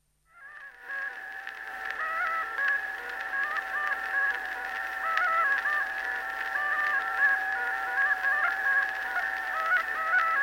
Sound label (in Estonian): Ingliska torupill folk; Kuusalu; Jakob Ratsov